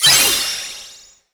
Item Get

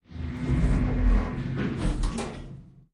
Mineshaft Elevator Finish; lethal company

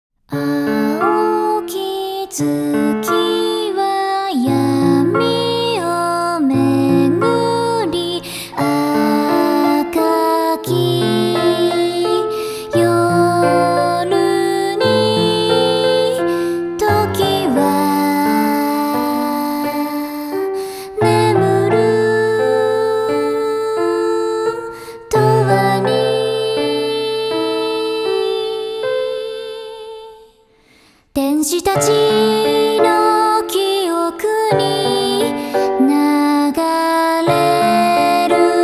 Theme (Japanese Vocal Version); Theme of Bloodstained; Bloodstained: Ritual of the Night

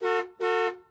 Ambience Car Horn; Subway Surfers City; Android, iOS

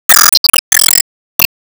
Enemy Tower Flash; disney star smash android ios mobile gamerip 2020

